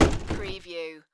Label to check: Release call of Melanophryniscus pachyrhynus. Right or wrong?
Wrong